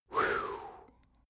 male relief sigh; koerpergeraeusche; menschen; soundpool

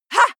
female shout attack short ha; schreie; menschen

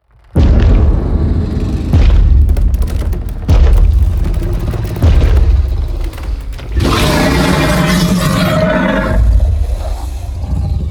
promo pad

